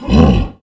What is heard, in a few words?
grunt, roshan; weapons; dota; creep